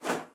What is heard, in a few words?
pegasus; minecraft; technic